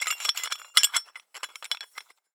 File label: Hole Saw Rattle, Hole Saw Bits